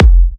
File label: Kicks F; markus hakala